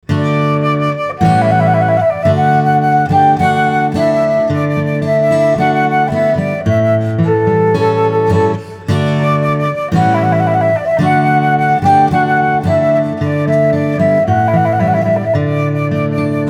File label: trumpet voluntary